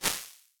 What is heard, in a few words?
Snare Long, impulse responses, gated reverbs; Small Spaces; Apple